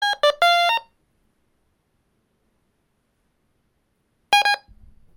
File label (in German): adx-150 einschalton